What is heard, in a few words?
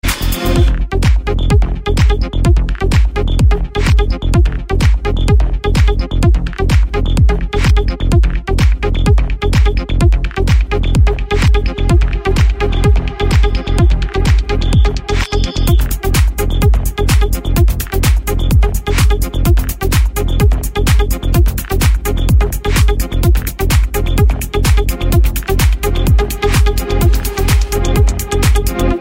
Groove